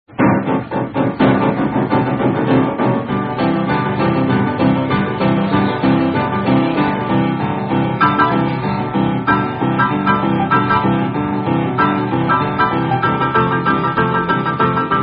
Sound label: Rock Song Instrumental